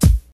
rich top end kick drum b key

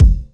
studio bass drum single hit f sharp key

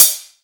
prominent hihat sample a sharp key; drum single hits one shots

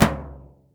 hi tome drum sample c key

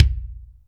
short reverb kick drum single shot a sharp key

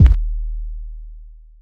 big kick sample d sharp key

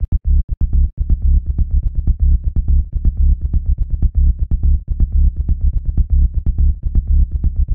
tech house bass samples - c sharp - 123; instruments; loops

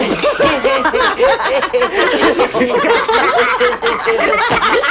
bar laughing; people

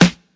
fluffy snare drum sample f key

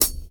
studio closed high hat sample c key; Duration 0:01; Samplerate 44100 Hz; hat samples

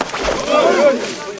surprised gasp

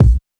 raw bass drum sample a sharp key; kick drum samples

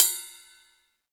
huge ride sound sample c key

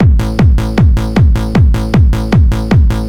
hardstyle kick and bass, Loudest frequency 319 Hz; drum loops

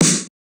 airy snare drum sample e key

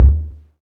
low tome drum sample D key

